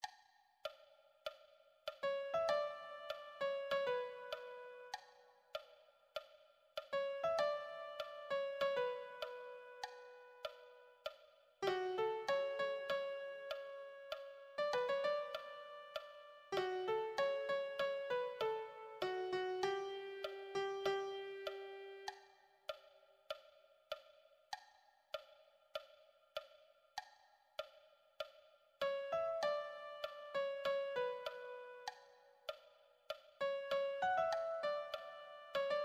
melody

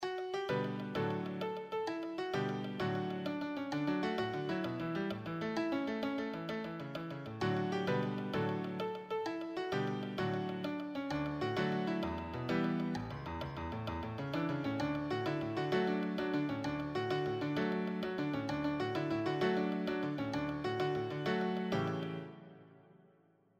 advanced solo, piano

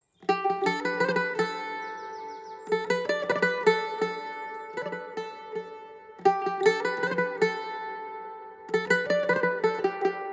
backing track

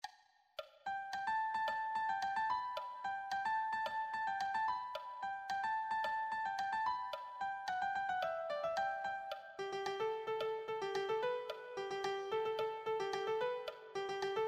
melody, piano

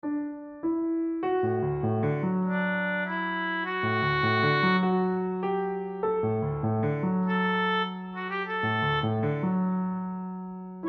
accomp, piano; Shor

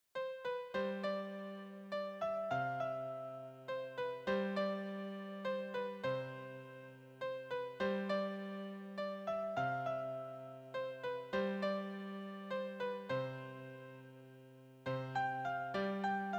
beginner solo sheet, piano

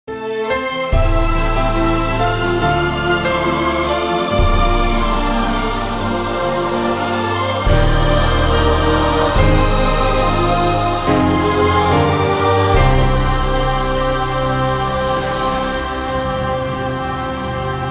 backing track

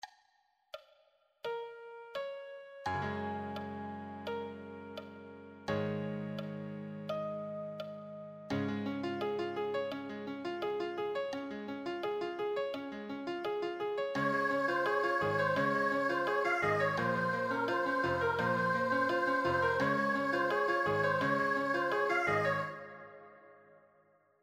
accompaniment

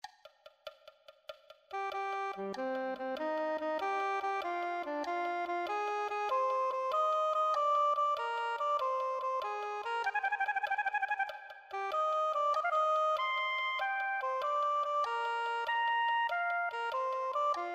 soprano saxophone

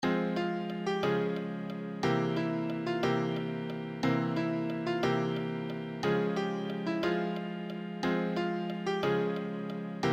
solo, piano

